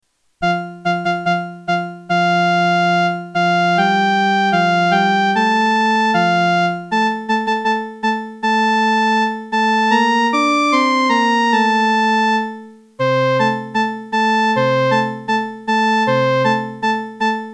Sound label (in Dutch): frans lied un kilometr a pied instrument eenstemmig